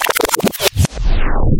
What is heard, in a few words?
backspin